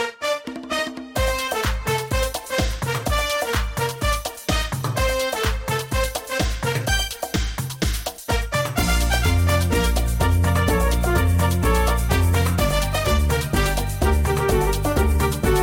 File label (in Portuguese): Trombetas, toque de celular